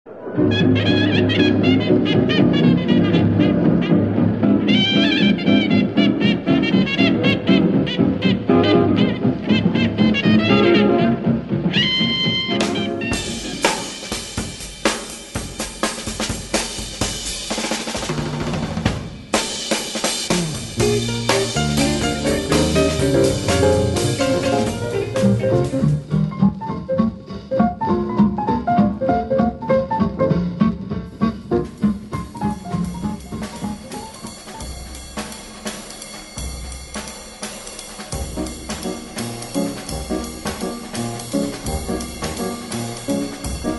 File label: The Jazz Mix